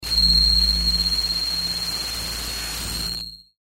Glitch Metallic Static Sweeper, Glitch Power Down Hiss Static Noise; Production Element Imaging Element Accent Transition; Blastwave